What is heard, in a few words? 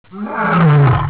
lion